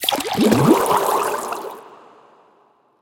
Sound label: start respin; berry burst